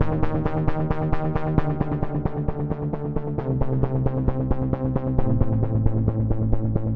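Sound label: dark minor bass pulse, 138 bpm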